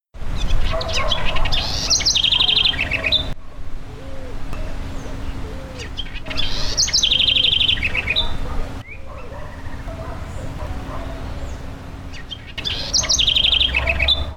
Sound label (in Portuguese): corruira, aves